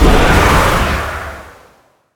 elder dragon form; dragon knight

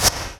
preattack; dota; puck

